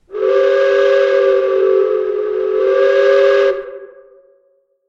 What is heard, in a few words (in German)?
dampflokpfeife 1 mal lange; salamisound